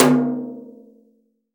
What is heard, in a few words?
TOM, CTD TOM BRSH